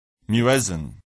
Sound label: muezzin